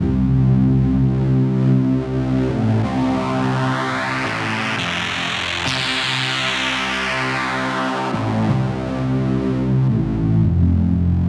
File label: Future Basspad, Drum & Bass